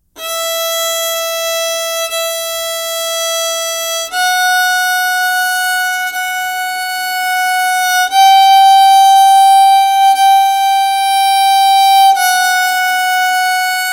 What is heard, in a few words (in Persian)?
Angosht Gozari Rooye Sime Aval Sol Bi Metronom; انگشت گذاری روی سیم دوم سل